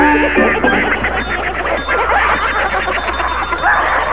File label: gremlaugh